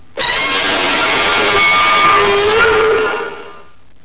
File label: roar